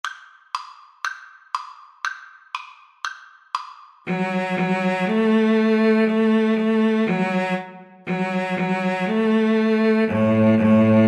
Viola Cello Duet

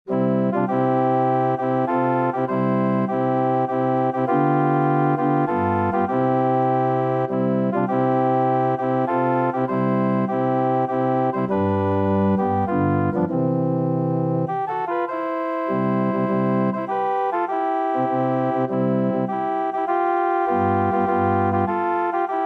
i will sing, organ

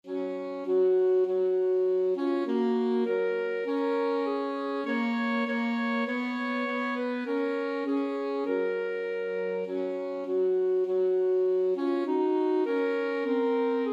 alto saxophone duet

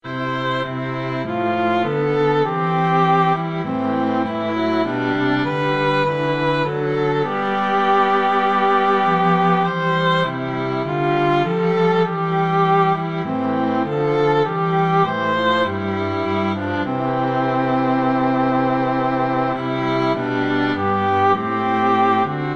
all things bright string trio

